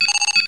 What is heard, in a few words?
button